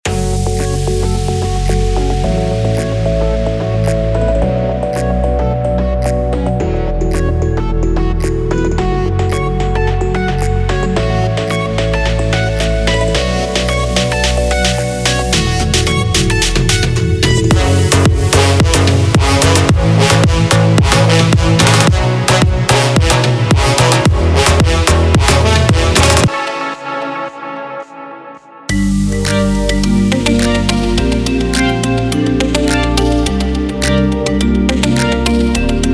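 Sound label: Euphoric Future Pop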